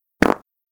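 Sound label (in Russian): звуки пук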